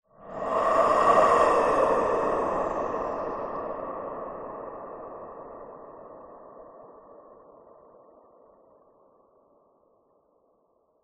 horror breath reverb ghost

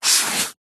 office sponge brush single stroke on paper; zapsplat

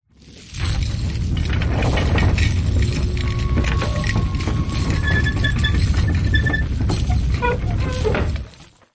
foley medieval castle drawbridge open

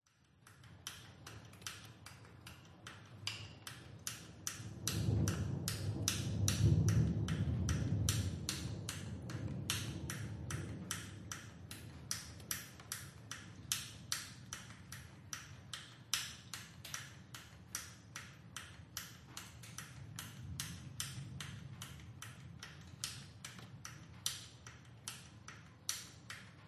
Rain Aftermath Drippy; ORTF; PCMD100

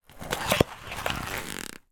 foley card box small squash

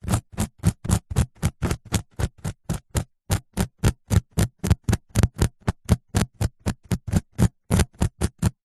foley small sharp spike scratch pick at carpet

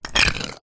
nature ice pieces thick scrape together

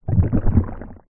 human stomach gurgle hungry or ill

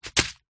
food small packet of cous cous drop on surface; zapsplat

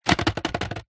household cassette vhs shake

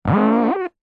office whiteboard rub fingers 2 squeak

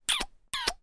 human kiss soft

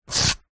food ice cream cone slide pull out from another; zapsplat

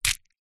food chocolate small foil wrapped catch in hand; zapsplat

